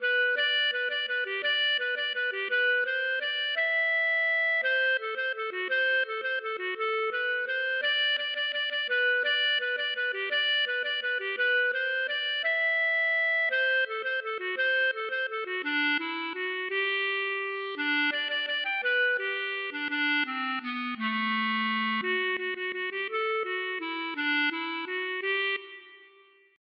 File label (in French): valse